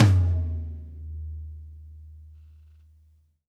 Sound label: Tom Shard; ZeroGravity Drum Shards